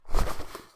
Backpack Take; Don't Be Afraid The First Toy

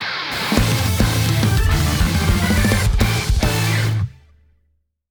Vs. Fanfare; Ultra Street Fighter II The Final Challengers

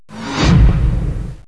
player die; Another Day

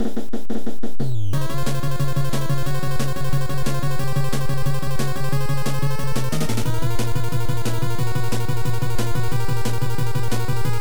Timer Low

